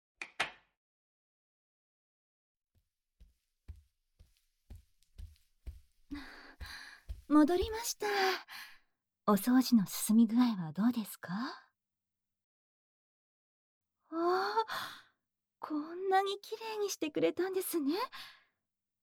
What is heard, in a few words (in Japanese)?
癒しの耳かき; SEあり